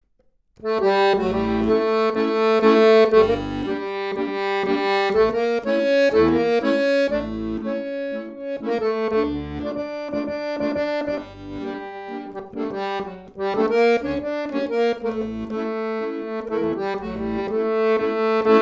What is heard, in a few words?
Klezmer; Alter Zhok